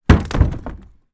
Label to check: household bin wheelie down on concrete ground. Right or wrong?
Right